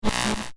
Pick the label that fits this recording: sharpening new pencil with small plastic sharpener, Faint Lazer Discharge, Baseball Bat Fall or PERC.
Faint Lazer Discharge